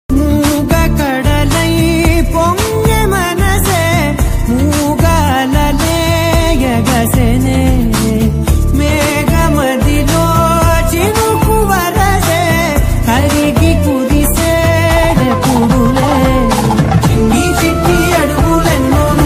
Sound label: song ringtone, telugu; jetty movie